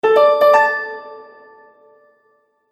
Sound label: Bright Melody Mobile App; Musical